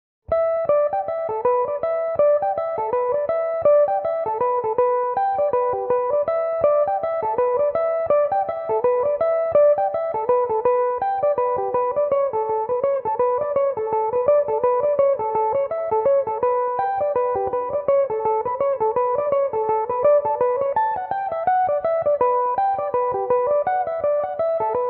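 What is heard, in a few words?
A mixolydian, irish mandolin, tunes; sean sa cheo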